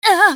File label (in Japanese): 被ダメージ; カルヤライネン